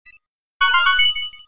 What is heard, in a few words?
red coin; Super Mario 64; Mario Coin Sound Clips